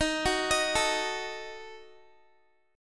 Eb Diminished, Guitar; Ebdim arpeggiated

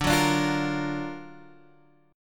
F sharp Augmented Major 7th, D

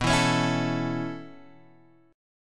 B 9th Suspended 4th B; B9sus4 Chord {7 7 X 6 5 5} strummed

